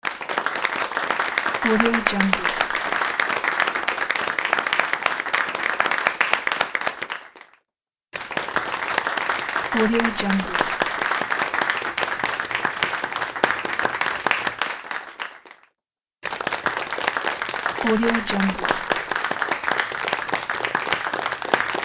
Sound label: applause clapping